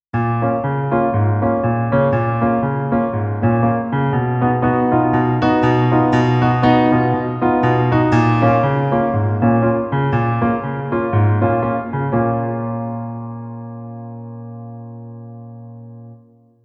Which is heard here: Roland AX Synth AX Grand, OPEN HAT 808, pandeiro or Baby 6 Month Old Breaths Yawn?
Roland AX Synth AX Grand